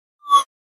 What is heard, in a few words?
Mouse Over Elect, mouse over